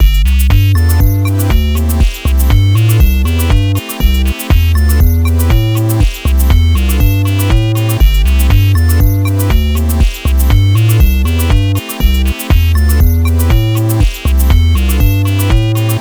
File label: Busy texture